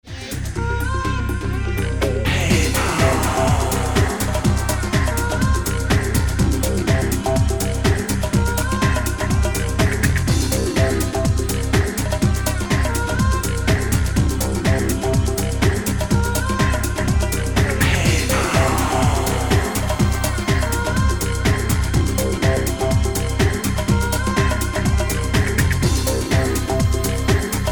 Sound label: Electric Guitar Headstock